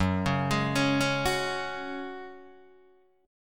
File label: F sharp Suspended 2nd, Guitar, DADGAD